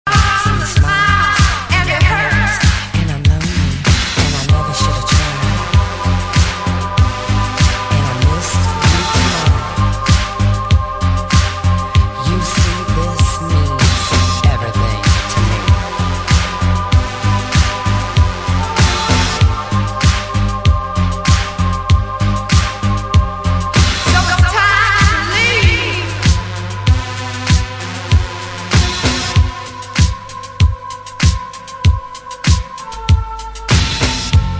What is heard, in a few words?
groove electric